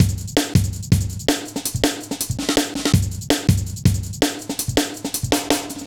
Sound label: Heavy Live B, Drum Loops, Jungle